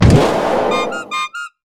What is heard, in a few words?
unicycle target; weapons; dota; ringmaster